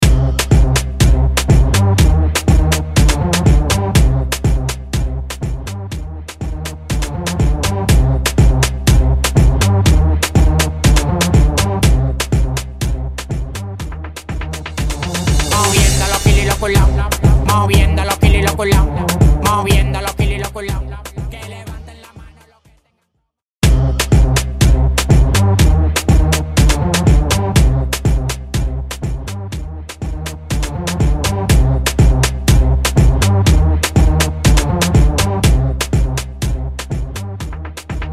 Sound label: Intro Break 122 BPM; Happy Birthday (Intro Outro)